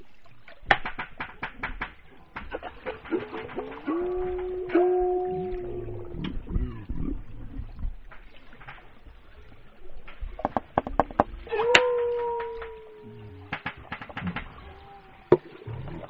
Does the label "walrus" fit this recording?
Yes